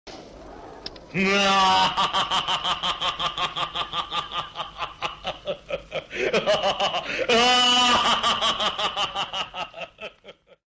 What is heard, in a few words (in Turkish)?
nihahahaha gulme efekti